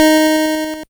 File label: GB lead D#; ChipShop Deluxe; ChipShop Hits